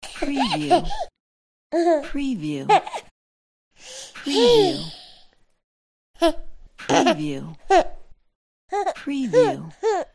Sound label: little baby laughing